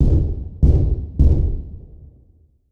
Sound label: mp5 3 shot far